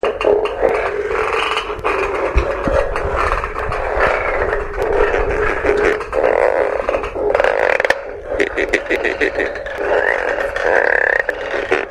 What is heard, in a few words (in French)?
anim grenouille